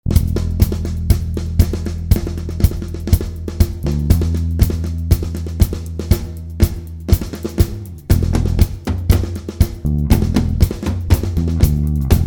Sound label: drum